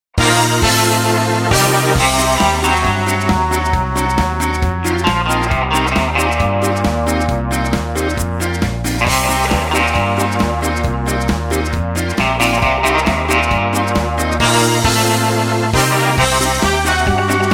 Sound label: BritSka